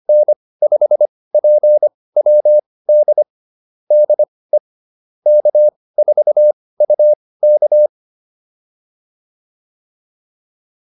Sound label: QSO, intermediate practice files